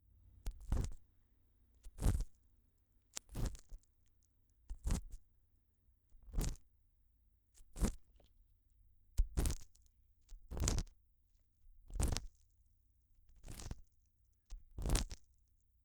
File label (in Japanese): 声無し耳かき音